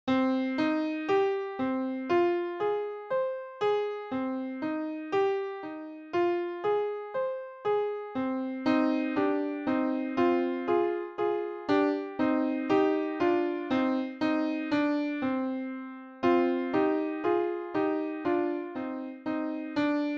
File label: One Octave Handbells; Jesus Christ Is Risen Today